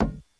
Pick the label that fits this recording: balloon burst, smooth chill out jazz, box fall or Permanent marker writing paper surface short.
box fall